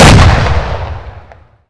Inf Weapons; Red Orchestra 41-45

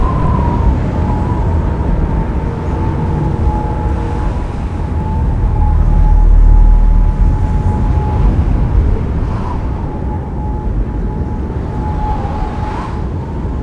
ambience terracotta

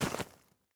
plant seed; Rust

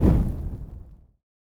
fire stop; Rust

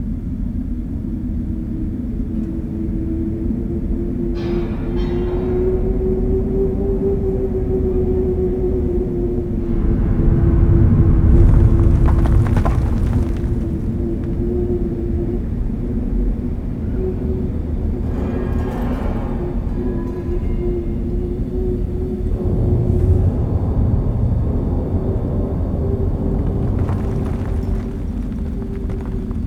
ambience office

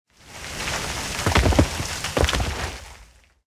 tanks explosion ground; explosions; War Thunder

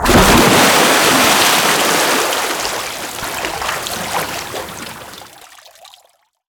impacts; World of Tanks

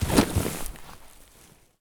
soil bodydrop; Escape from Tarkov

